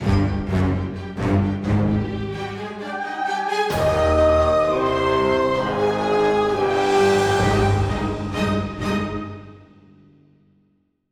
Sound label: Round Start Halloween